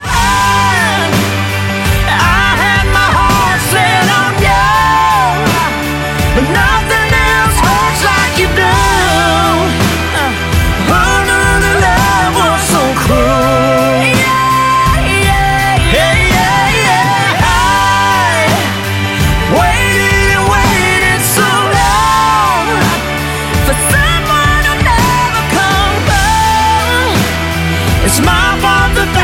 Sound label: Ringtone